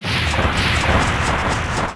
bomb

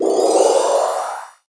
heal

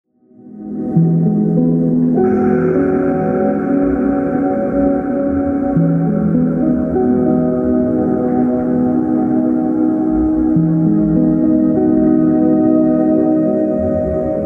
lake; minecraft

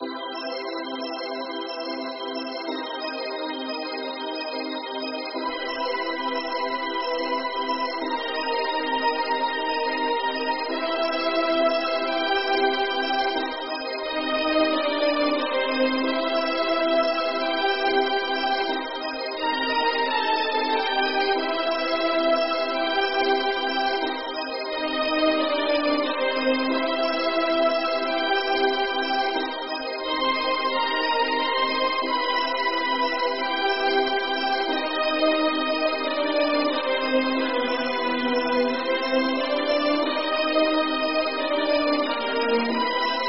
Fairy

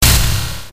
thunder

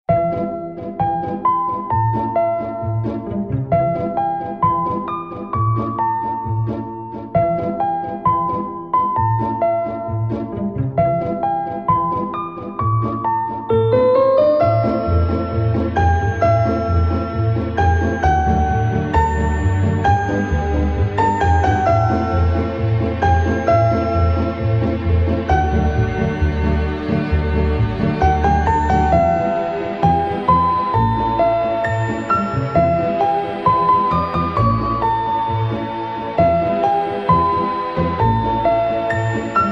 bgm

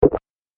Heal

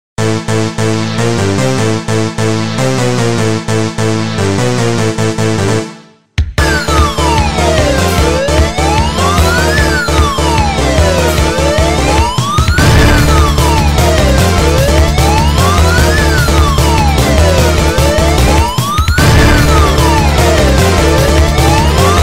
bgm boss

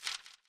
paper

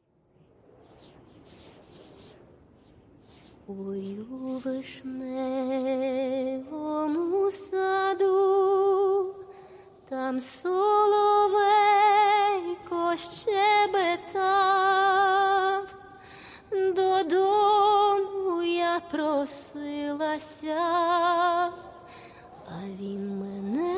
music

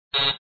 wrong

